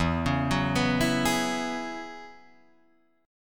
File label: E 6th Add 9th E down Guitar Drop D